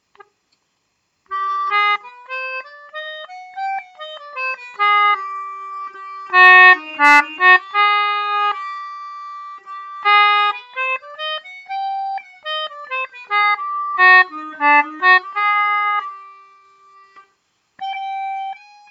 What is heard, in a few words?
noodling AbEbF